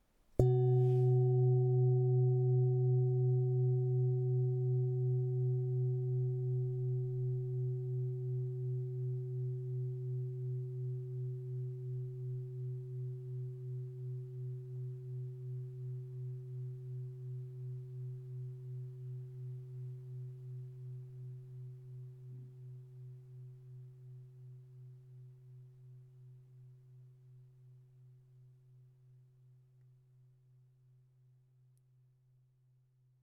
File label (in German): Schale